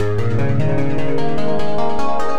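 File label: Moving Arp Line, G# minor 100 bpm; MIDI Clips; Tonal; Motifs; Ableton; Factory Packs; Mood Reel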